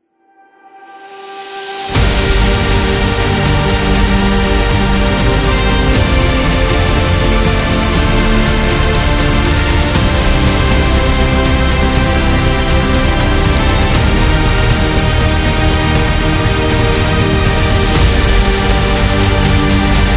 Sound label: Epic Cinematic Background